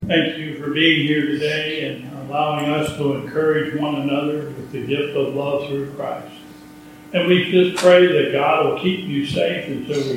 Closing Chorus

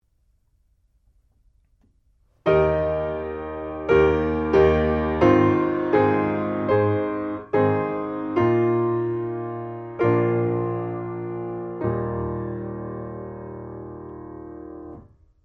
Break Thou the Bread of Life, piano, Hymns; Sunday Service

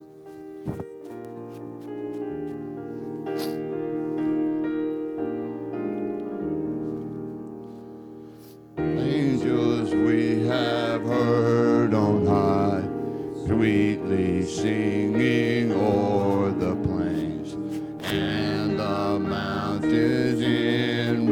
Choir; Angels We Have Heard; Bethel Choir; Sunday Service; Specials

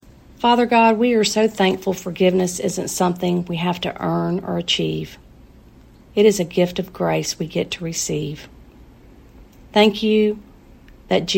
Benediction and Closing Chorus

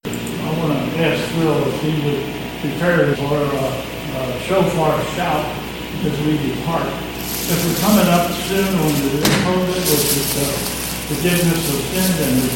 The Last Shofar Call; Sunday Service

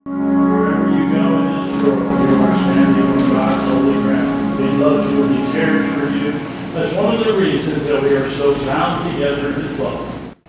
Benediction Closing Chorus and Recess; ...the School of the Holy Spirit is in Recess until...; Bethel UMC

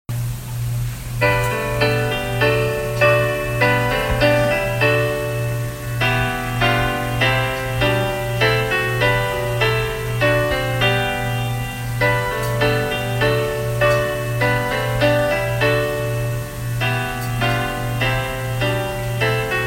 Liebster Jesu, Hymns

